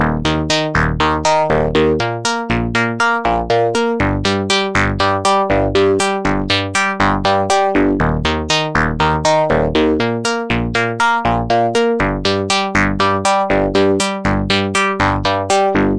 hard arp filter slow arpeggio, synth and electronic loops